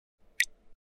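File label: sounds of dew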